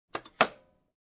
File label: Overhead Bin Closed click sound